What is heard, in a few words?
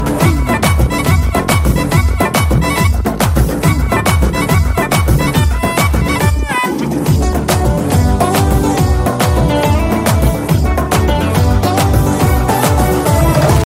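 hindi music ringtone, bollywood ringtones; sholay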